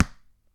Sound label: Basket Ball Bounce